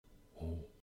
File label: moan